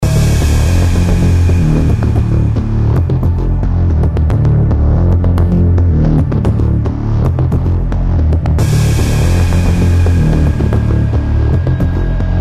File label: Scorched Land - Ambient Loop; deepfear